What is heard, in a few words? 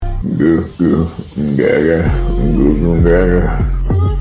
googoo gag a